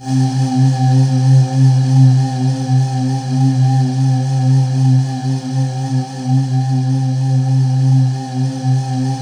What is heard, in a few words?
C3, Synth Leads